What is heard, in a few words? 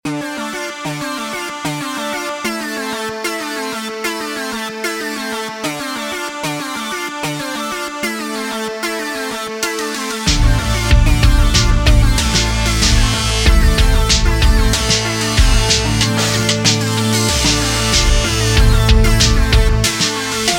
MAIN THEME 8bit light symphonic